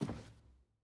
Footstep Wood

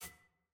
UI Menu Generic Toggle Hover

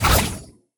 Attack Jump; bloom minigame

